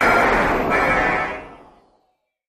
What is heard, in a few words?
Digital Zinger Fly By, stinger